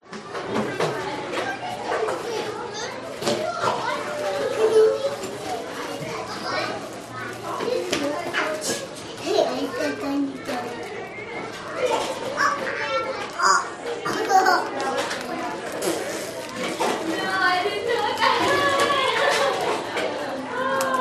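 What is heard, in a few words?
pre school morning ambience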